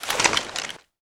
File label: deploy, desert eagle; weapons